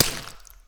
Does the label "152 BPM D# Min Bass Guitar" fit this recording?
No